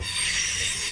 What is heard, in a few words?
subway sound; Subway Sound Construction Kit